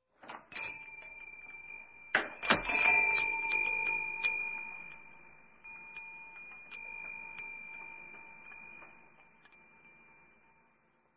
Dvernoy kolokolcgik; колокольчик; Любой звук колокольчика, корабельного, музыкального, маленького, большого, одиночные удары

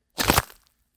perelom shei s hrustom

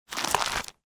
bystroe prolistyvaenie so zvukom trrrr

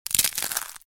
hrust pri potjagivanii

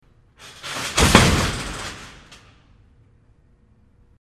padenie na mjagkuju reshetku

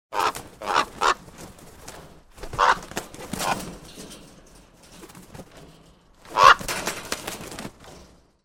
vorona pytaetsja vybratsja iz kletki

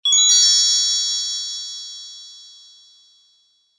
dvojnoj zvuk bleska

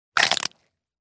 zvuk pereloma kosti v sustave

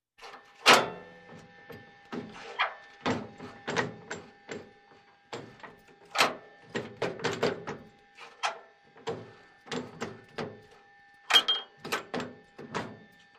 Zvuk tanka; Звук танка; Звук танка в движении, заводка, старт, остановка внутри и снаружи. Выстрелы танка

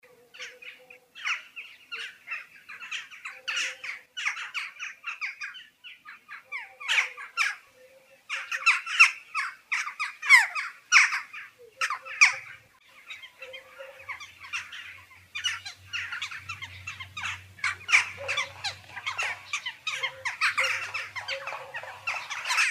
zima v derevne s voronami

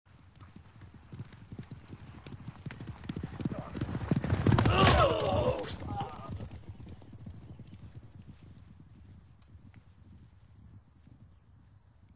bitva rycarej s kopjami na loshadjah dvoe

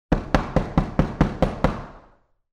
s akkustikoj komnaty

